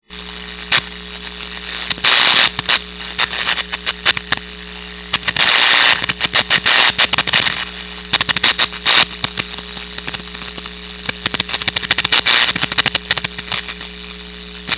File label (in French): arc electrique